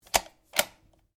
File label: RIFLE CZ 452 VARMINT 22 CALIBRE BOLT ACTION OPEN; A selection of domesttic firearms; Rifles